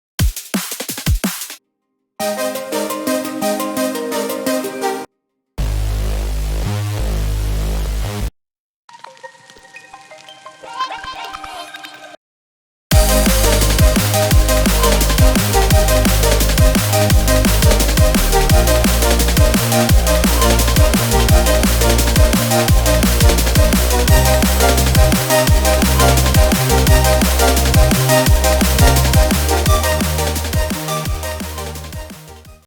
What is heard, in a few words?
Making drum and bass!